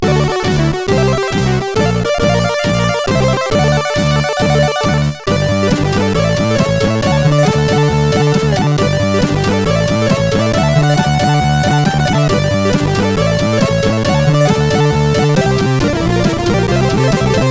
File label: Fanfare, CSG8580R5; Chrono Trigger